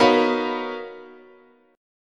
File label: B Major Sharp 11th, guitar chord